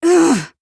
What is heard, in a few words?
"ngh"; tokoae